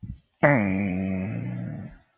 Music Musical instrument Percussion Drum Timpani, vocal imitation